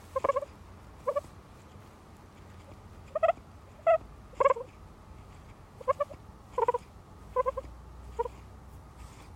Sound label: purring, guinea pig